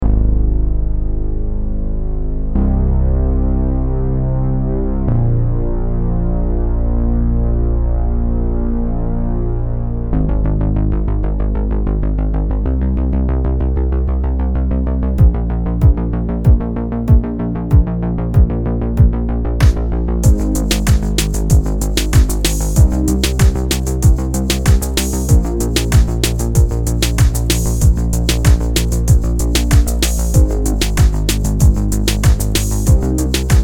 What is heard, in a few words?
Instrumental, rockpop